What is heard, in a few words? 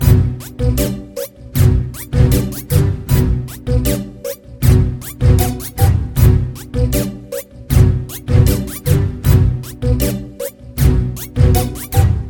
Mafia Hip Hop